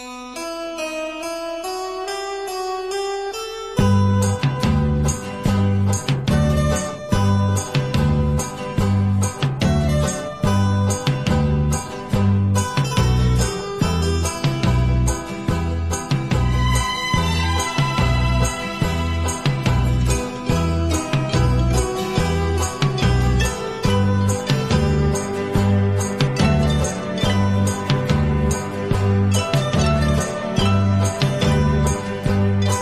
Instrumental Ringtone for iPhone

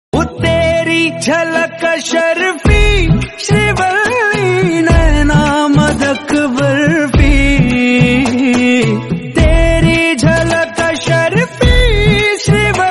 Love Ringtone; Pushpa

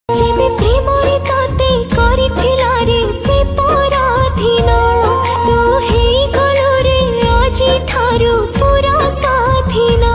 New Odia Sad Ringtone

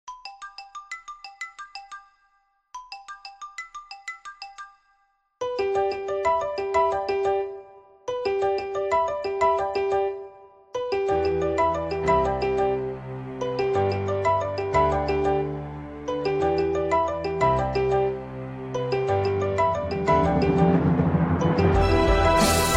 New Apple Ringtone; ringtones

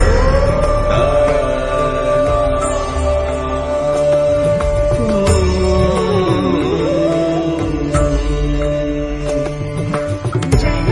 Yoga Anthem Song Ringtone

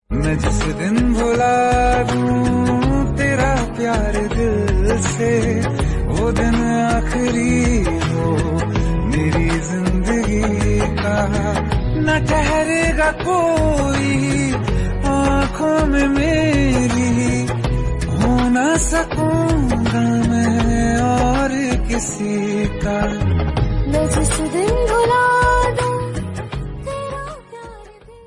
Hindi Sad Love Song Ringtone

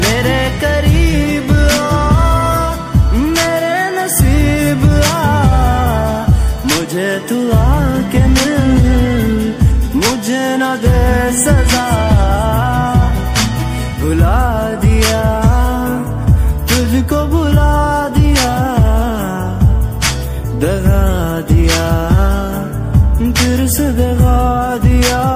Bollywood Sad Ringtone

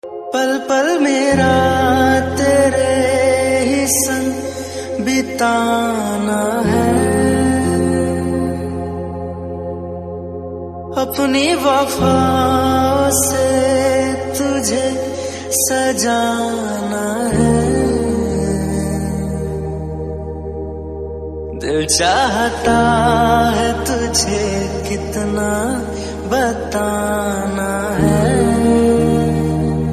Hindi Ringtone; Dil Bechara 2020

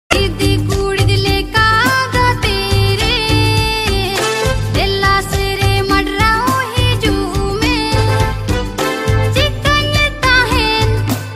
Santali Ringtone

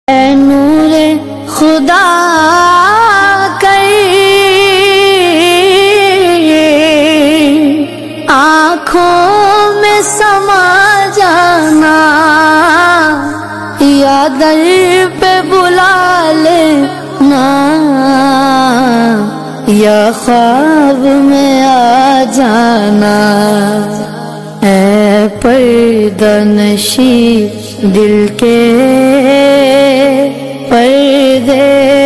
Naat Ringtone

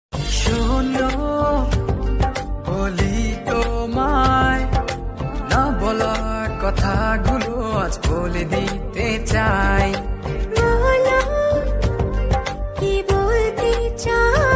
Bengali Song Ringtone

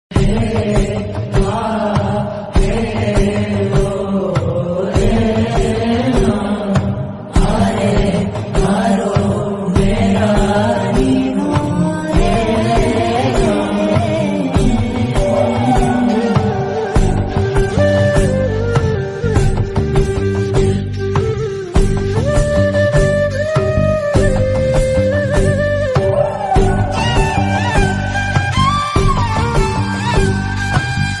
Tamil Song BGM Ringtone; ringtones; Kantara; Karma

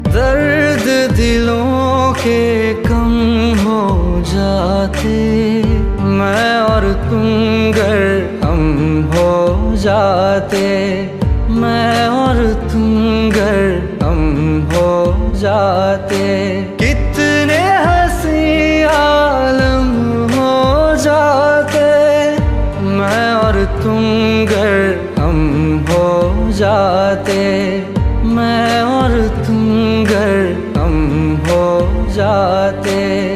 Sad Hindi Song Ringtone

